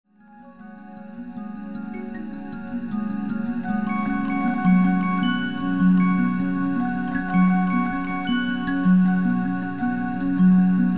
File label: array mbira and array midi controller